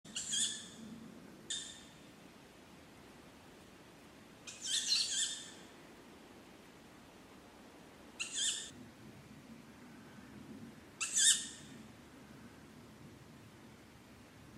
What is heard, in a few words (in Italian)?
giovane allocco canto